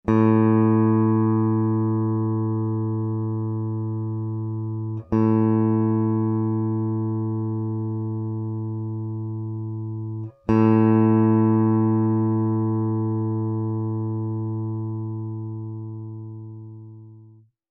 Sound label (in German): Stimmton A